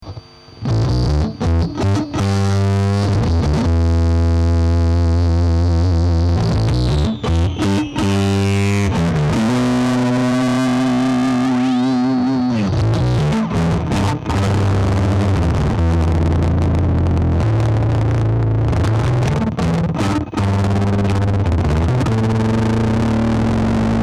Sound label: bass distorted